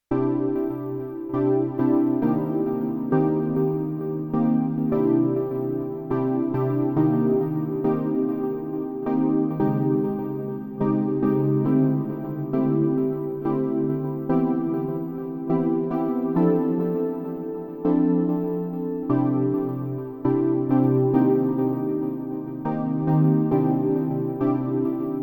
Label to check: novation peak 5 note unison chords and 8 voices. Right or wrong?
Right